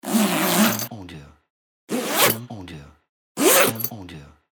Zipper Bags

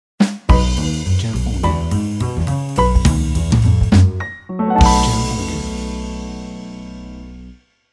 Jazz Piano Ident

